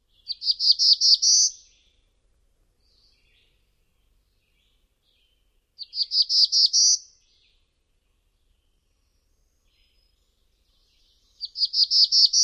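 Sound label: songs, Black-throated Blue Warbler; NY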